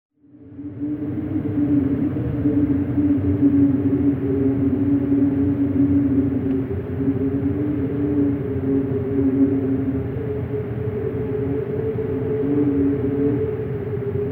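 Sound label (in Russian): холодный гудящий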